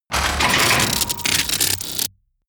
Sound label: robot transformation metal